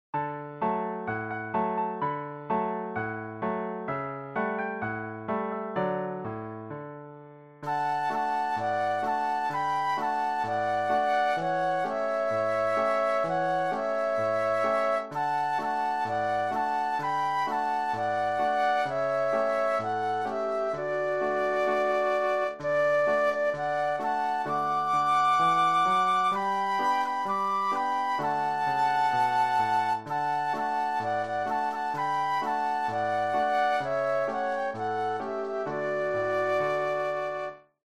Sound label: Flute Duet and Piano